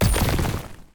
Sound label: pick axe break